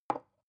glass put down tipping fast

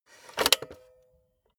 cassette player slide tray insert soft

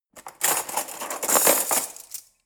cutlery forks drawer grab

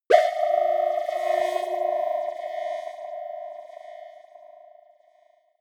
creepy modulator short

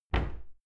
cupboard close normal

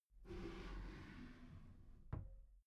desk drawer close soft

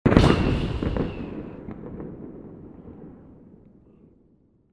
explosion

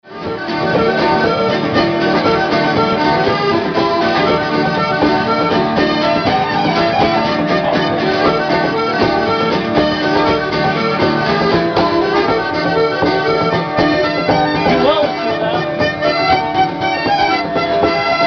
irish scottish

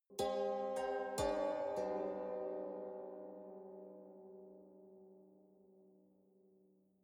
haunted strings sequence soft

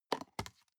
plastic box food small open soft

